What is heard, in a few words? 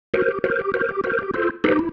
Single Riff, Organ